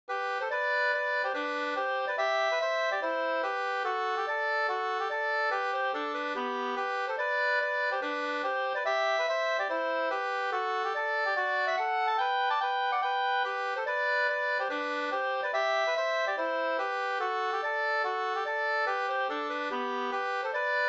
reinlender

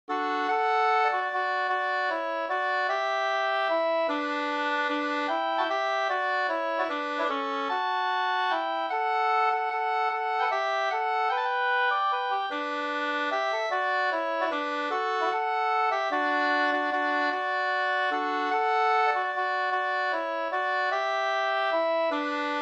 vals; Osteraker

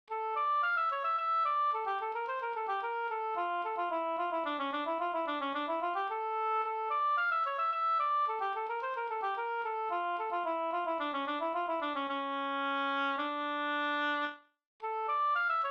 slängpolska

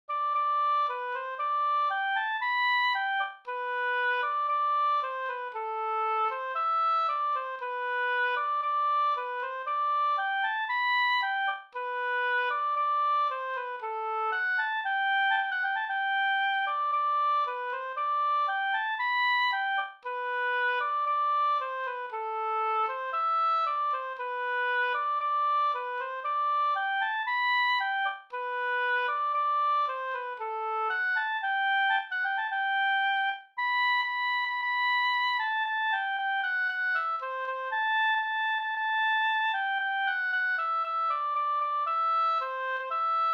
brudmarsch; Liljendals brollopsmarsch

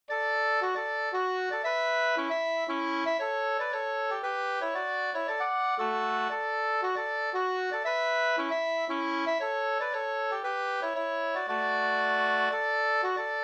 hamburska, 2st

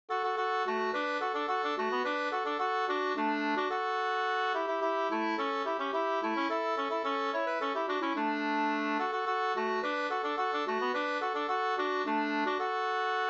polka, 2st

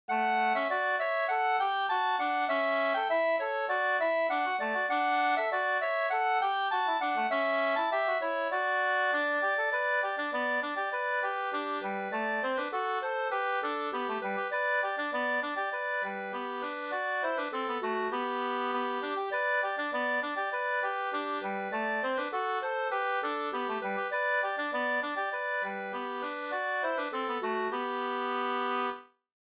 ganglat

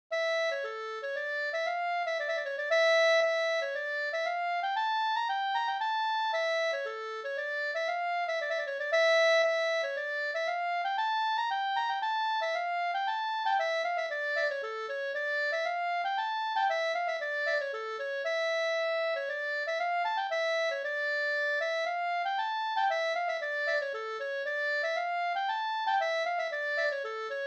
polska fr Rättvik

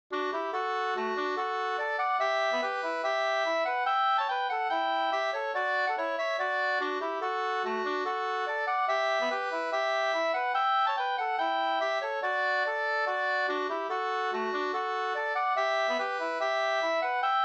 schottis

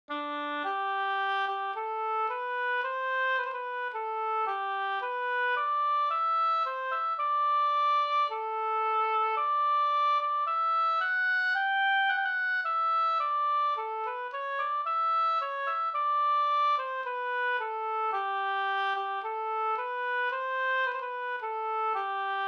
brudmarsch Alvdalens brudmarsch

